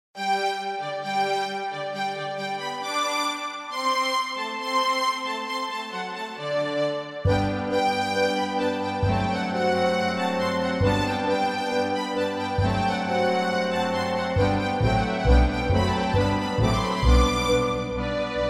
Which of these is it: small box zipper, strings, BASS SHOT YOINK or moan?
strings